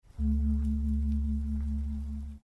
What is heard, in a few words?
new skill point info sound